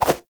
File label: Run Whoosh, Foley, Footsteps; Enemies; Warframe; Lasrian Soldiers; Lasrian Butcher